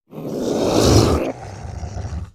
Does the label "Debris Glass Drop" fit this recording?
No